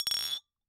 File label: Bottle Struck